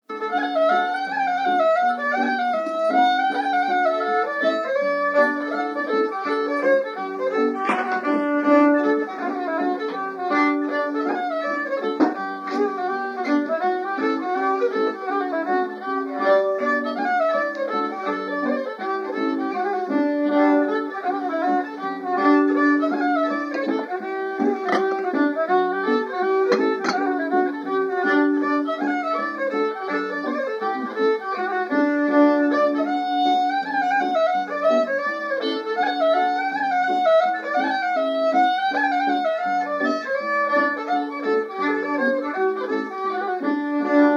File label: hornpipe; The Blackbird; Nancys